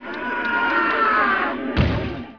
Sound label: pfaargh